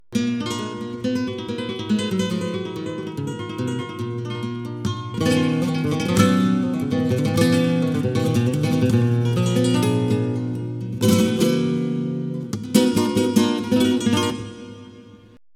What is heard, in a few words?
Solea